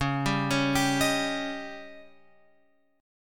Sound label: C sharp Suspended 2nd Flat 5th C sharp, chord